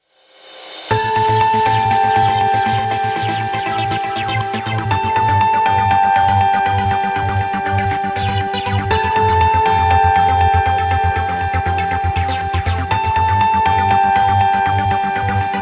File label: Menu; Shaftdiver